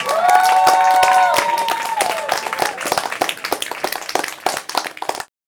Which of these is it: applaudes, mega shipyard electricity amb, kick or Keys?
applaudes